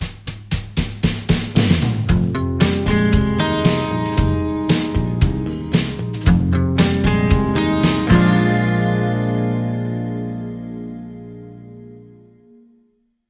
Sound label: Excited